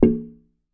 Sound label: African Wooden Drum Mid; ETHNIC and WORLD PERCUSSION